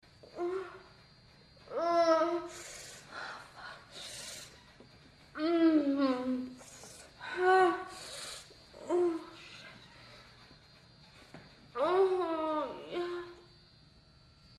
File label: moan, moans; The Witcher Hunt